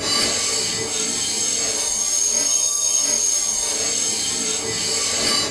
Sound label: Flange; OpenBVE